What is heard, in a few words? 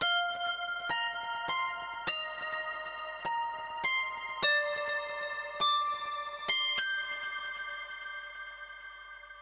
Melodies; simple session